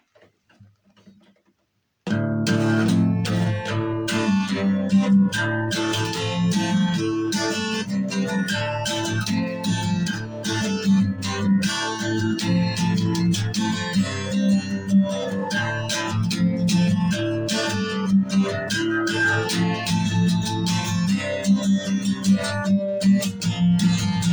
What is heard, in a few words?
guitar